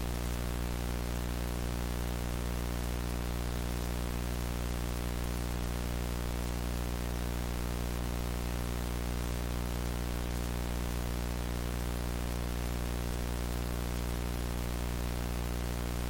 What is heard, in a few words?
vhs noise